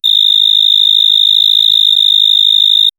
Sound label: cricket sound night, insects